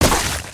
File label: wooden smashable; destructibles